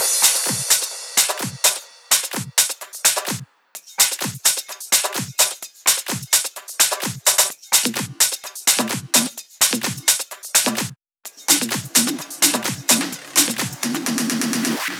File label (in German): Drum Loop 128BPM FULL ohne BD